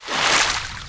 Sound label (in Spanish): nadar